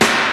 juicy snare drum sample F sharp key, Royality free snare one shot tuned to the F# note